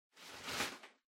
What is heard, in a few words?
Packing Paper Far Rustle; 梱包紙01-09(遠い ガサガサ); 効果音＞梱包材